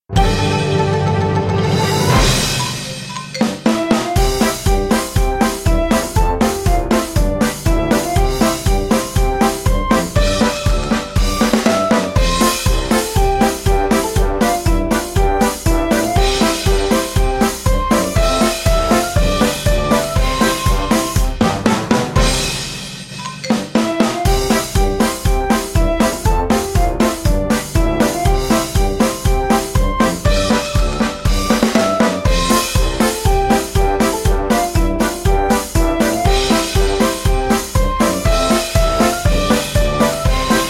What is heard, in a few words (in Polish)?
skoczna muzyka utwor instrumentalny